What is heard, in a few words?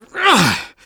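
Engineer taunt exert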